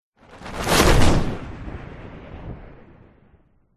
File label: superhero fly by fast whoosh cape; zapsplat